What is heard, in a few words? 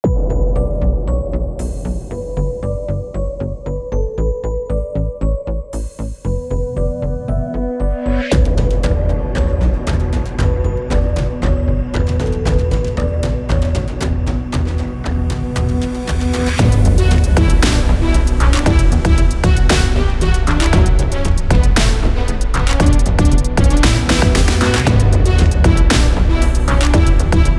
Epic Game Intro; FreeLancer